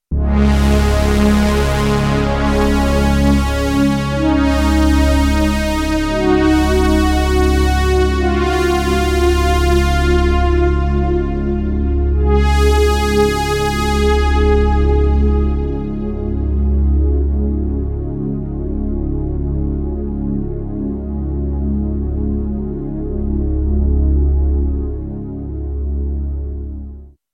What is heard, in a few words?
Korg minilogue xd